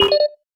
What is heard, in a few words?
chomp